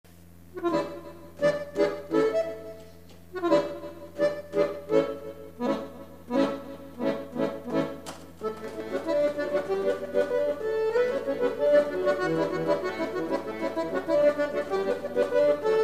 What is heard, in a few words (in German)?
Galop, Alleinunterhalter Akkordeonist; Alleinunterhalter Musik